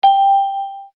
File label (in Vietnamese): Am thanh bam nut bam de chiu